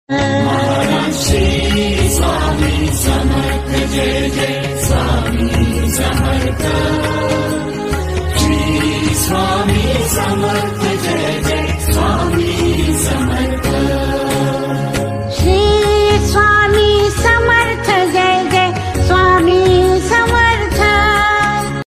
Ringtone Mahadev Ringtone Shiva Ringtone BGM; Swami Samarth

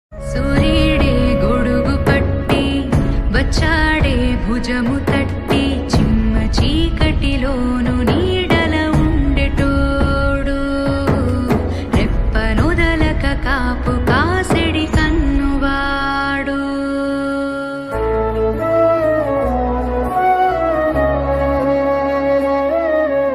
telugu ringtone; salaar

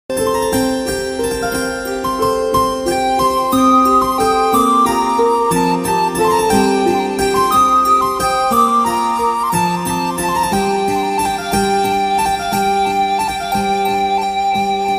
tamil father love bgm sad

